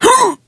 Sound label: death